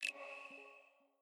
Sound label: Tracker Success; Peloton